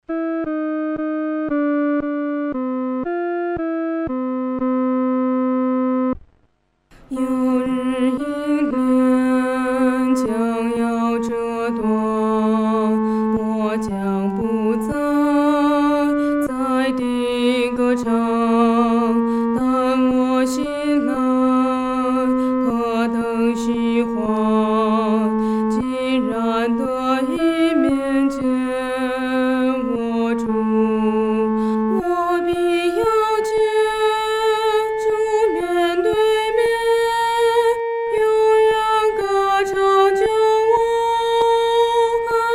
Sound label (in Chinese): 独唱 第二声